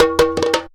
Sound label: SABARO ROLLS; Best Service; World Colours